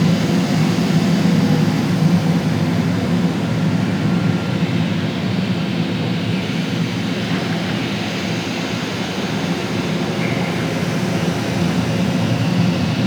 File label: Ocean Interior Ambience; Warframe; Grineer Ocean